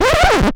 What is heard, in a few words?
Record Scratch